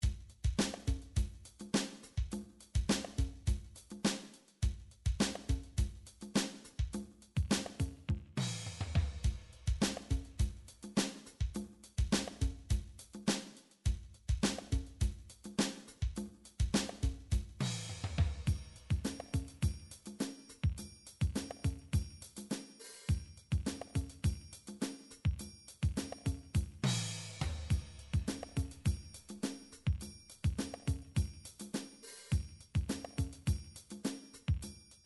drum midi files contemp; Drum Midi Files Collection

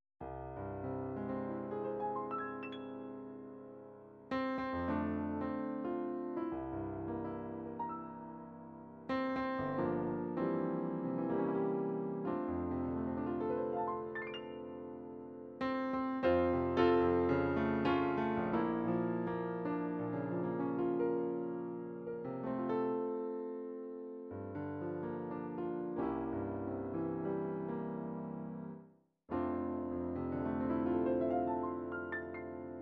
jazz, midi

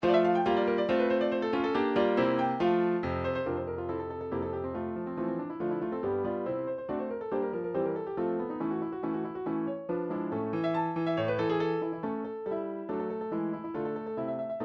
german song, midi

